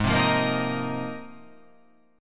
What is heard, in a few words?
G sharp 6th Add 9th G sharp